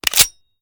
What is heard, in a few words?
reload fast empty charge; gmod; pi mike